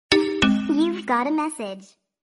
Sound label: you got message tone ringtone, sms ringtones